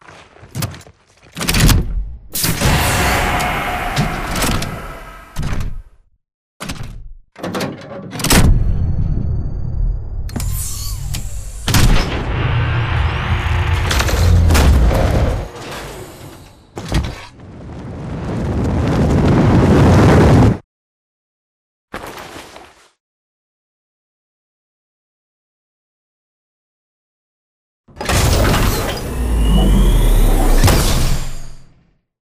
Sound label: dish complete; Titanfall 2